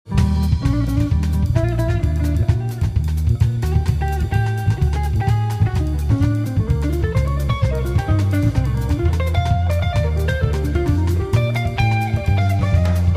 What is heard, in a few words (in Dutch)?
Gitaarsolo